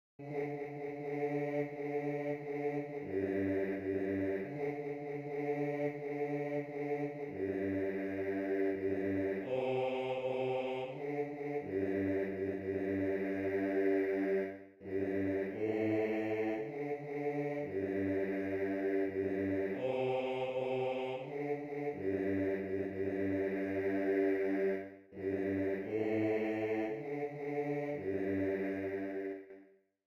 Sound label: Bass II